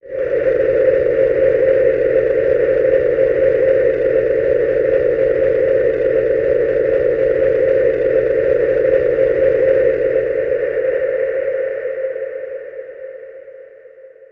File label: Chemical Pad No Drums